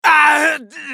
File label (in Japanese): ダウン; ソホ(格闘型)